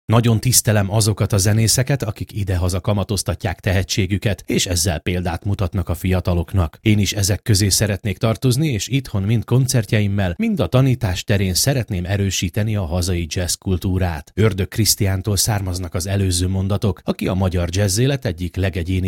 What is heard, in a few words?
jazz